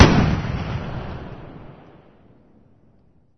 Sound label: M242; Weapons; DCS